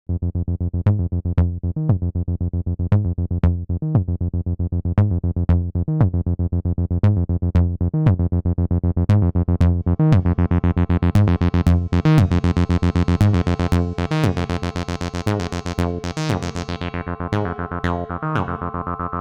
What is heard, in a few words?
Quantec QRS 303 all room sizes